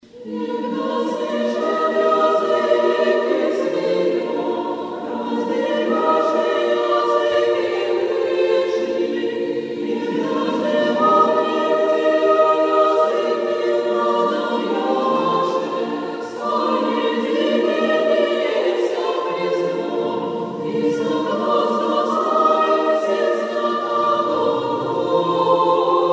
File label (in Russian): kondak; sv. troice